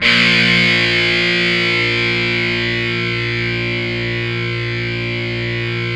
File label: MTL A#2, METAL; InVision Interactive; Lightware VOL-1 - Instruments & Percussions; METAL 5THS